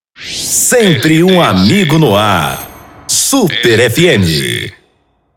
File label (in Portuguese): intercom